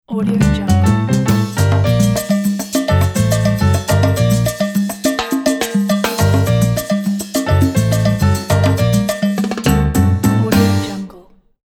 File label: advertisement latin logo